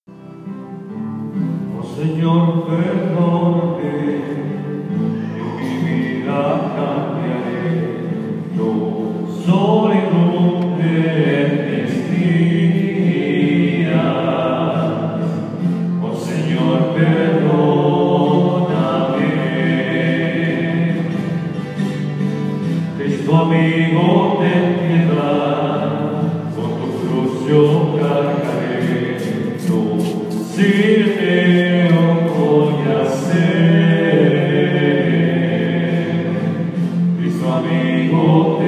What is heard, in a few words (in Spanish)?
Canto de perdón